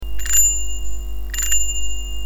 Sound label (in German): Fahrradklingel; Diamant